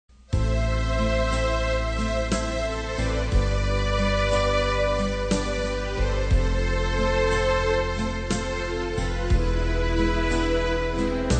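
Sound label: soprano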